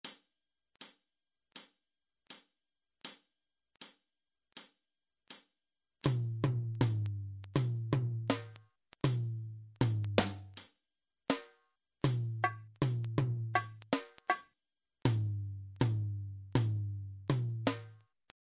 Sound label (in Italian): Esercizio ritmico